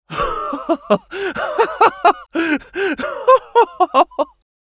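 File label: laugh happy, French; Scout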